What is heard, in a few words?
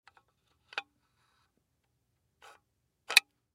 Door Lock Slide, Door Lock Slide Lock; Door Lock Slide Slow Quiet Unlock; Household; Objects; Blastwave